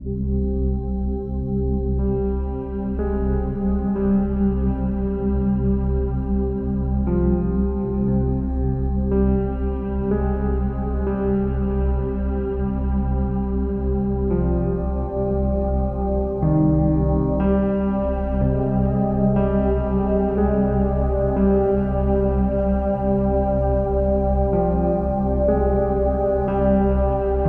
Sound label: Dark Matter, drama sad reflective